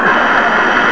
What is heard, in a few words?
buzzer